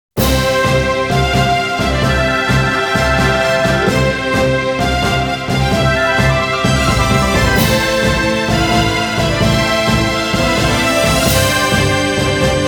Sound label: Victory Song; Tear Ring Saga